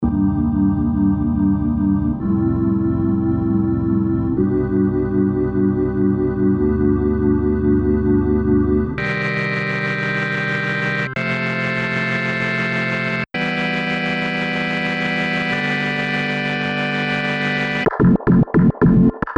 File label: Organ